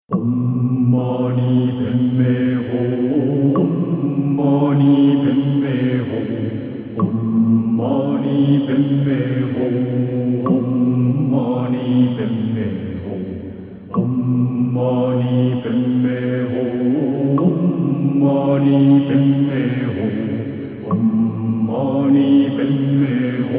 om mani padme hum

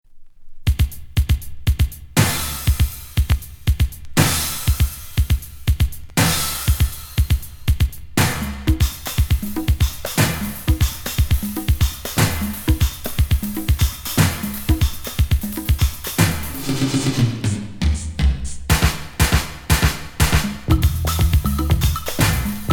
AFRO BEAT

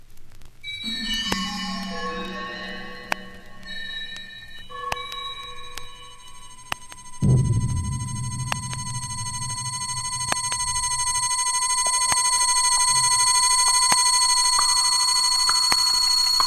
electronic music

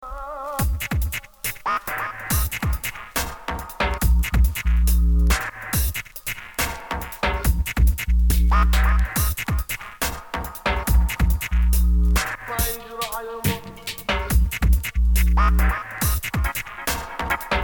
dubstep

